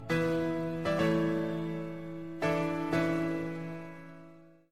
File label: dun dun duuun dun dun